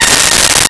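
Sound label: Mute Trumpet Bb5; Nintendo; July 2020 Gigaleak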